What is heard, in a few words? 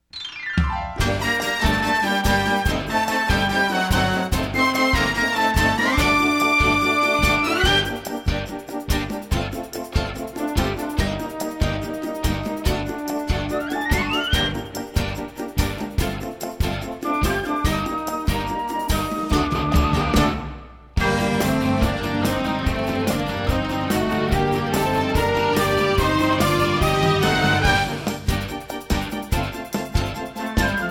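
accompaniment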